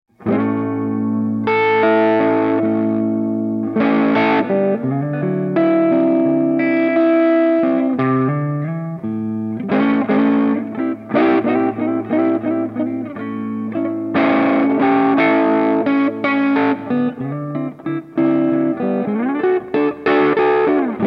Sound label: twango min gain comp; Twangophile; comp10o clock